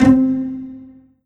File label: Korg TR Rack Pizzicato Ensemble C4